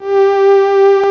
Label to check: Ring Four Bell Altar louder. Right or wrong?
Wrong